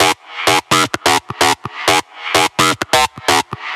128 Fm Angry Synth, Melodic Loops; Electronisounds

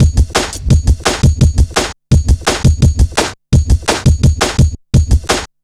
170, Drum Loops, Drum & Bass

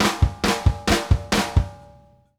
SNARE KICK, drum fills; Sampleheads - New York City Drumworks VOL-1

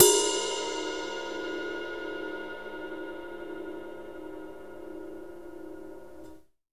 RIDE20HV B; Big Fish Audio; Ross Garfield Drums VOL-2; CYMBALS

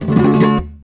RASGAND D# MJ, FLAMENCO; FLAMENCO FX; Guitars; Spectrasonics; Hans Zimmer Guitars VOL-2

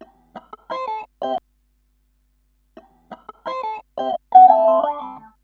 GTR L; USB Soundscan vol.31; Miro s Grooves; AKAI

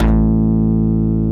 SMACK BASS

